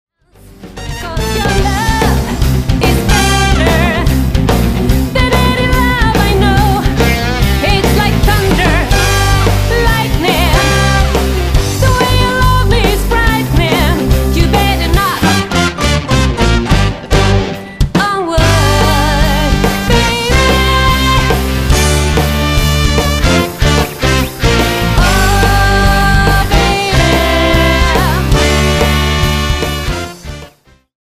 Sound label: Knock on wood